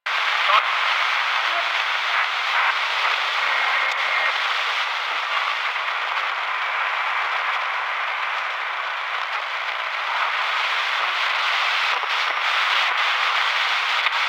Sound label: TV Glitch Loop; Warframe; Ambience; Nineteen Ninety Nine